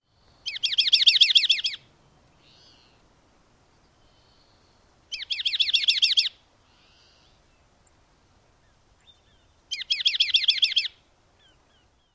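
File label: Canyon Towhee songs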